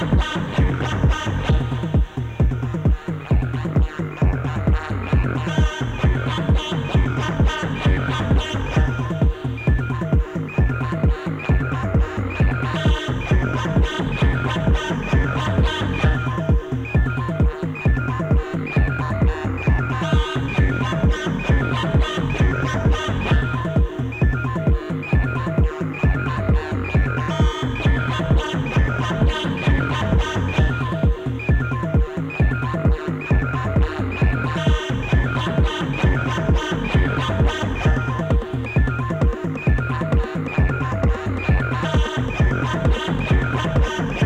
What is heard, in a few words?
various techno mix